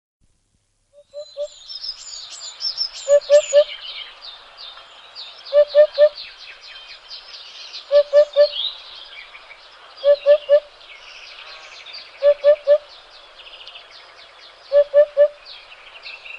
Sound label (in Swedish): Harfagel, Faglar